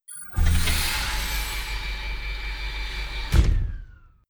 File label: Sci-Fi Door